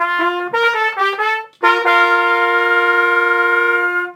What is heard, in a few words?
fanfare win